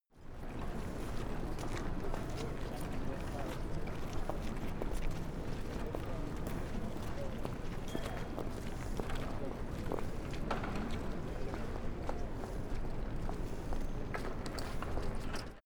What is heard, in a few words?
city winter pedestrians